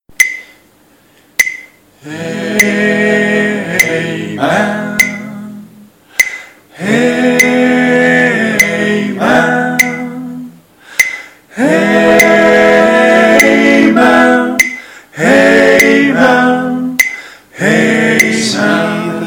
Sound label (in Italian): amen tutti, coro